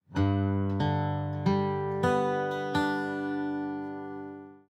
arp bone

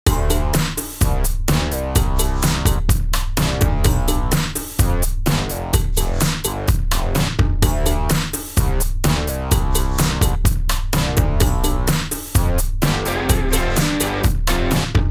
multiband after

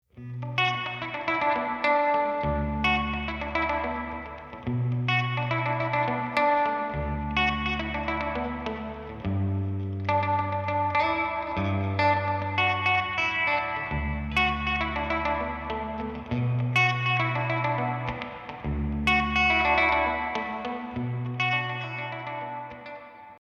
tele modern; UAD Capitol Chambers

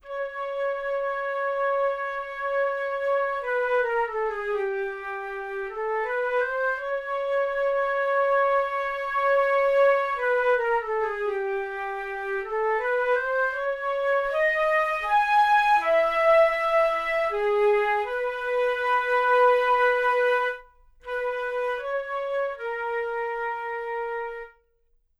ensemble close mic; EastWest

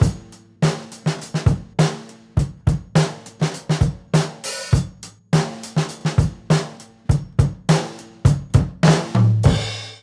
Drum Smash

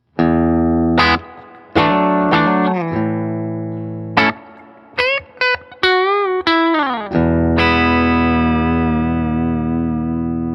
Bridge Clean; Reverend

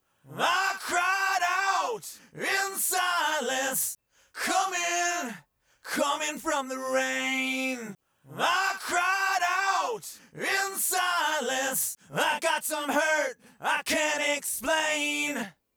Vocals

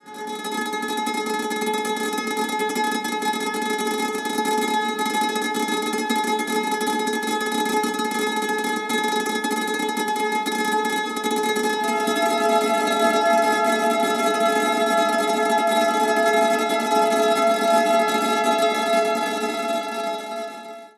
Surgical EQ AFTER

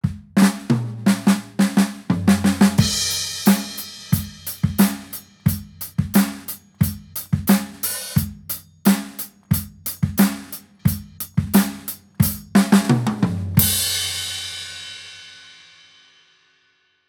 Drums Solo

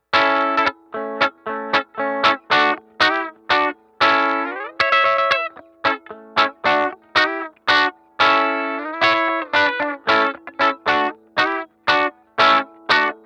335 top inst channel

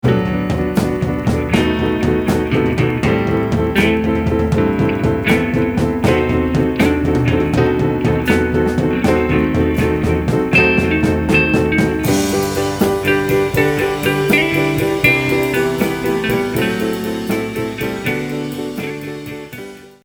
Ineffective Sustain Compres

